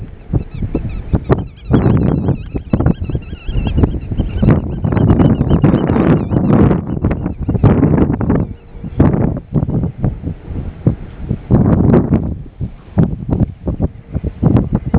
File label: phalarope